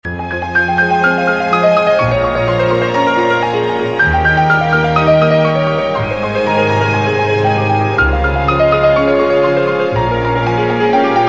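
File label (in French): Prélude pour piano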